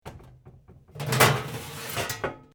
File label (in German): Herd Blech raus nehmen